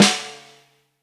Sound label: Snare, Live Kit; neuro; Stanza